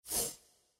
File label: Roll